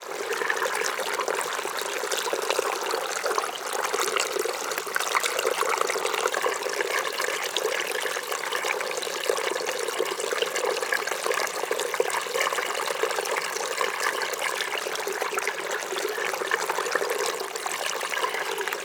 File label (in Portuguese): Pequena Queda Dagua captada bem proxima